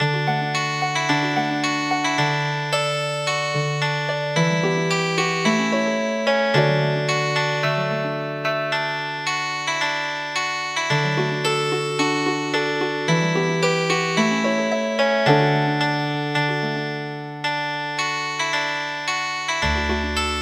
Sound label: Village dance Harp; traditional; gangar